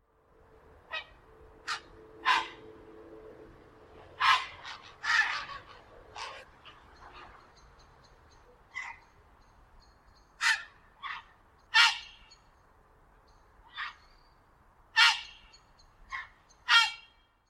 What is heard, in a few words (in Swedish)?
Gråhäger